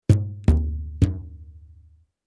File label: Elastic rubber band plucking multiple